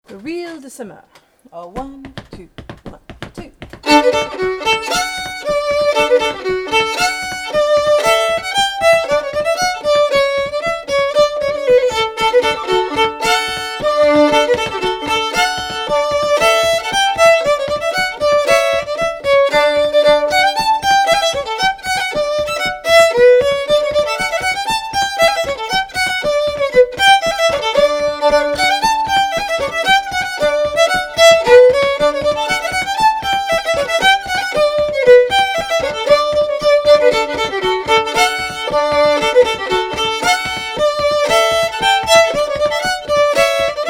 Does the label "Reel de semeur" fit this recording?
Yes